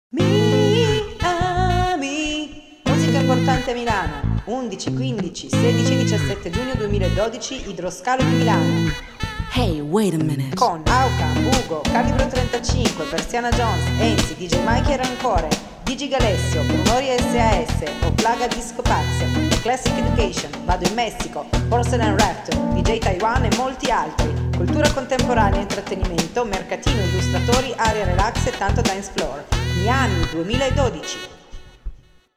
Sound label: Jingle; MiAmi Festival 2012